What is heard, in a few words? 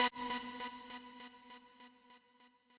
lead